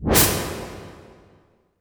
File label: IMP WIPE, Cinematic Impact